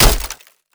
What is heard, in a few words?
impact glass shield; Escape from Tarkov